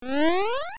Snore; The Maku Tree [OOS] snoring; Oracle

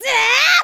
Spin Attack; Link's spin-attack shouts

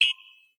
Cheer; Cheering emote: 1 pitch for each Link (Recordings)

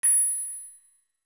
Smashed Distant

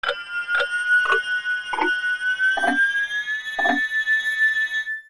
Inverted Slow; Slowing time, and returning time to normal with the Inverted Song of Time

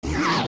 Taunt; Gohma

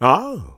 Ah; Bearded Baron: "Ah!"; zelda

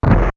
Bone Burn; The skeleton burning at the Skull Woods entrance ("Lamp" and "Door Close" sounds combined); Zelda

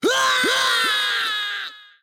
Death Cry

